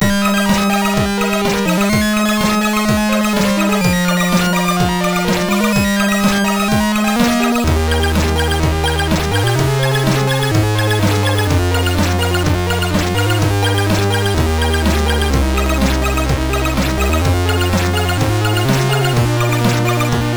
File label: sid MOS6581R3